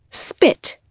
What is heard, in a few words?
spit